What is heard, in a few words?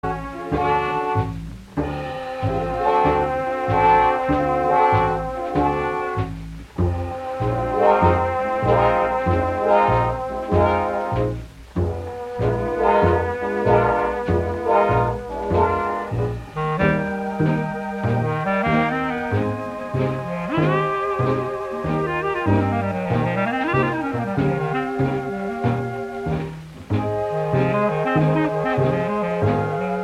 Clarinet Lament